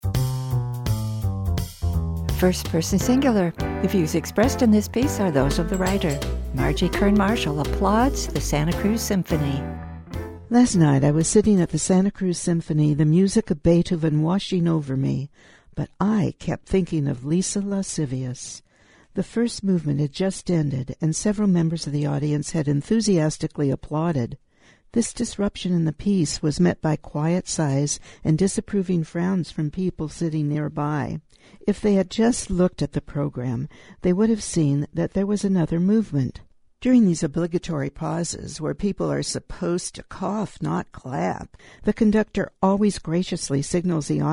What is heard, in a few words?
applause